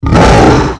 BEATTACK

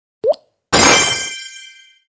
emoticon

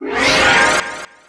SKILL BUFF

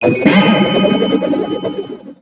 BATTLE LOSE

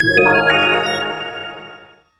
music stone yes

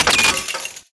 cash

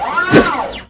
ATTACK

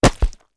MINING

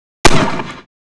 ATTACK JUMP

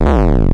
CLUB BAD

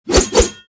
ATTACK JUMP

